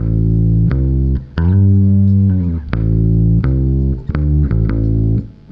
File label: bass; BASS GTR